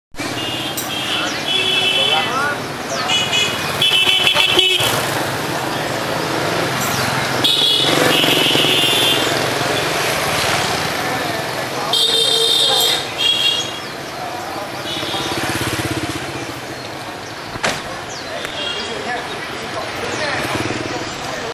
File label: hanoi traffic